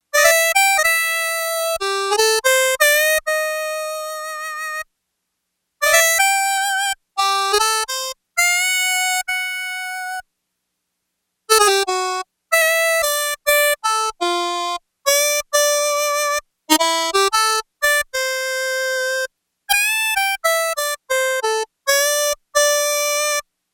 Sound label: Harmonica, Yamaha TX802; Factory Patches; Voices